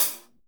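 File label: hi hat; Best Service Gigapack 2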